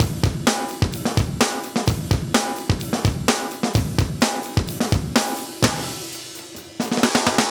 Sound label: Live Breaks, 128